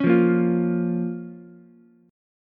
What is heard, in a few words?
F Suspended 4th Sharp 5th, down, Ukulele; strummed; JGuitar